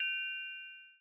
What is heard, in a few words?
triangle, drums